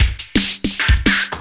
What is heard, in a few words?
loop, 170 BPM, drum & bass